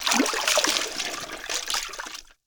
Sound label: Water Pail Death Underlay